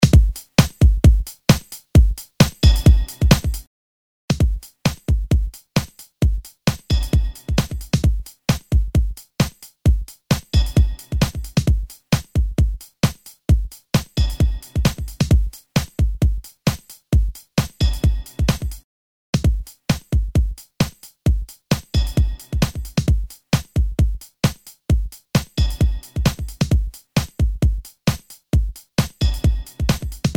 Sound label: beat